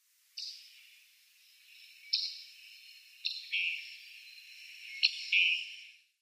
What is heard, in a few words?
Summer Tanager calls